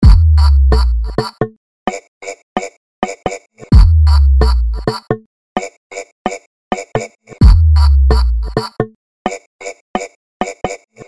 Beat exclusivo; HBR Produtora

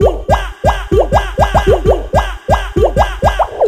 BEAT BOLHA ORIGINAL NERVOSO

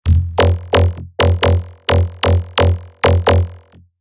beat estilo megatron

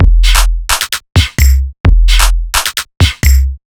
BEAT GINGADO

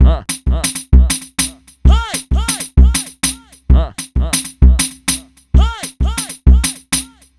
Beat Pandeiro; DF KIT

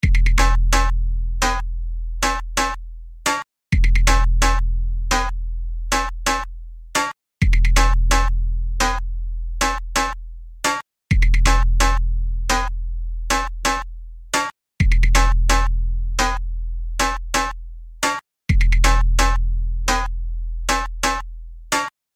Beat Panelinha; Nervosa

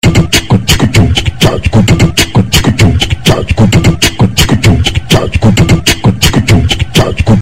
BEAT KICK DUPLO

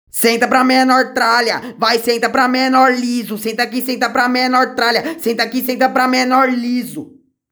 ACAPELLA

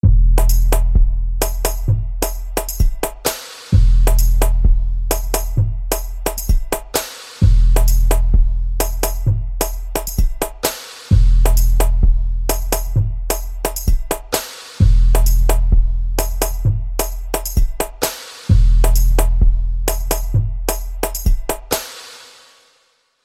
beat calmaria

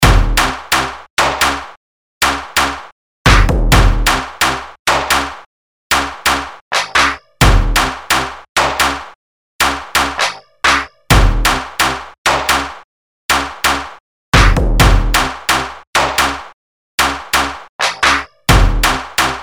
beat

